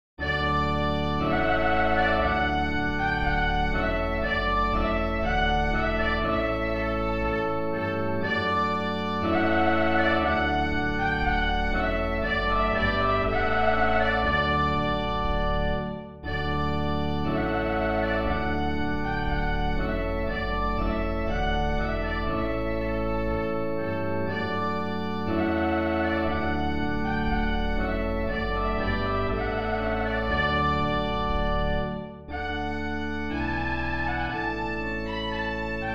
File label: trumpet voluntary; clarke jeremiah